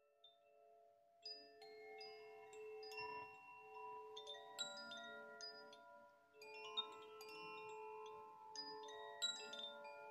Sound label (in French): Carillons; le nid sonore